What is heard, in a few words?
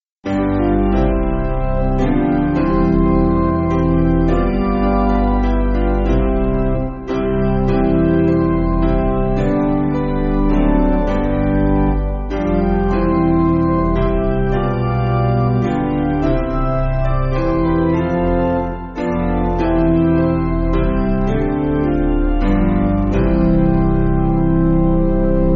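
Piano, Music Backing Files for Churches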